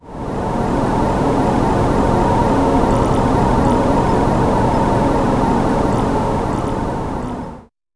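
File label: a distant muffled wind blowing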